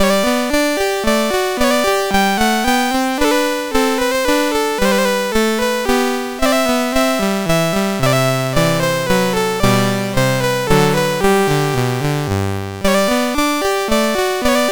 MOS6581; Two Minuets in G Major; MOS6581R2

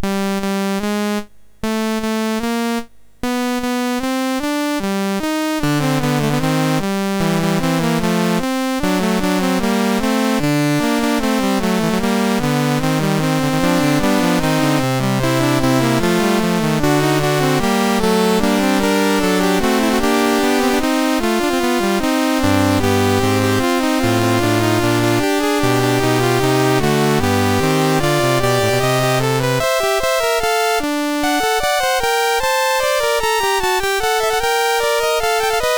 Fugue, MOS6581R3; Fugue VII 7

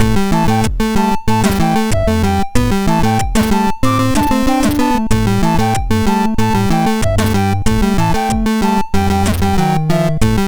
sid MOS6581R3

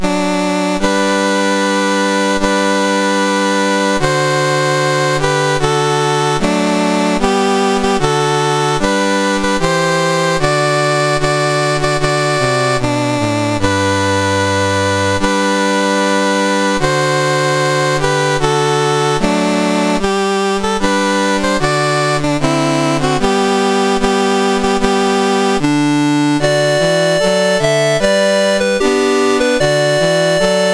Nepal, National Anthems, MOS 6581

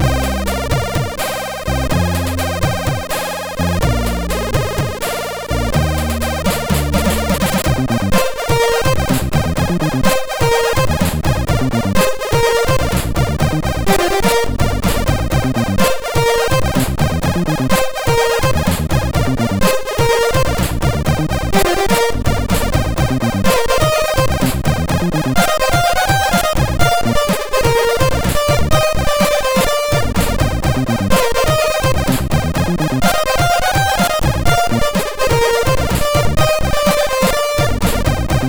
Remix sid MOS6581R4; Hawkeye

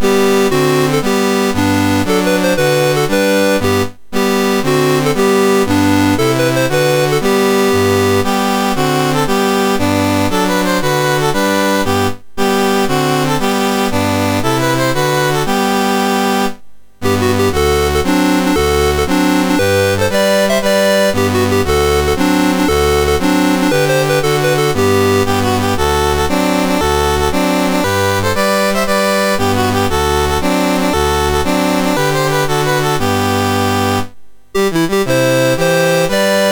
MOS6581R3, National Anthems; Philippines

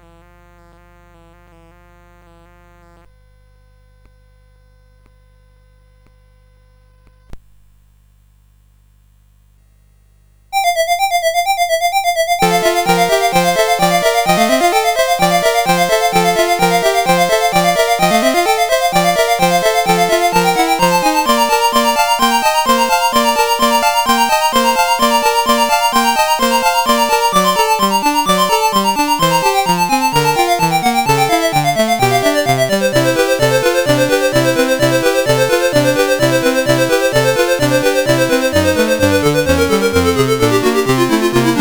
CSG8580; CSG8580R5

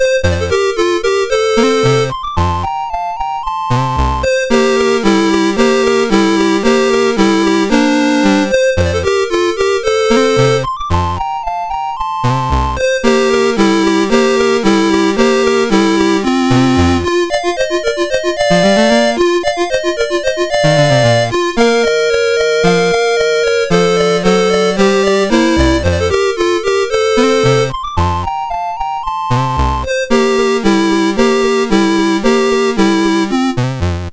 MOS 6581; MOS6581R4; She Elf

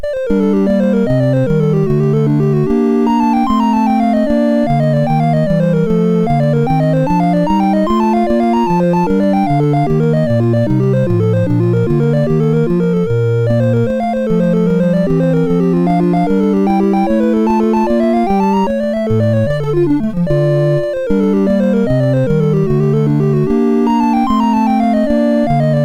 Gigue; MOS6581R2

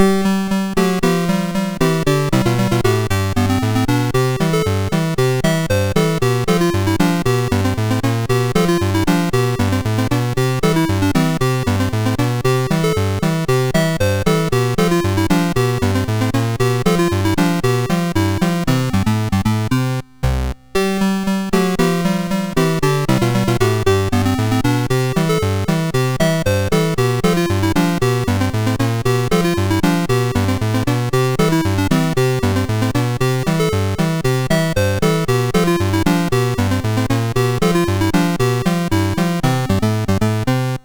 sid MOS6581R2

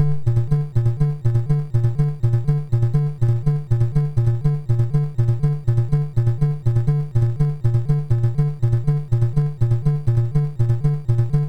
sid, MOS6581R2; Donkey Kong 1983

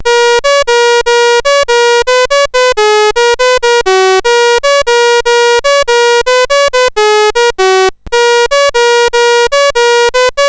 Tune BASIC sid CSG8580R5

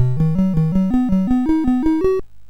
sid MOS6581R4; Cave Climber

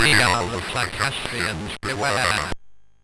MOS6581R3, sid; Psycastria

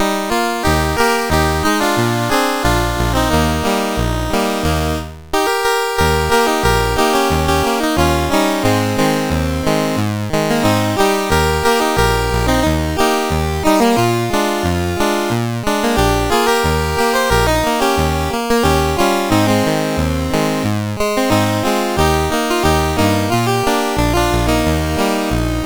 Singing Doors, CSG8580R5; Tom B24

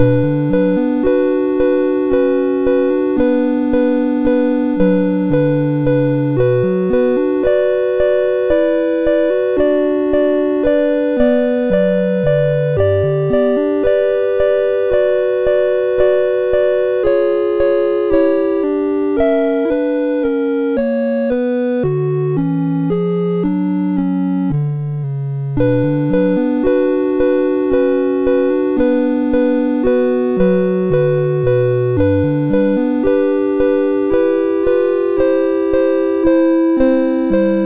Prelude of the Bells, MOS6581R2; Veeger

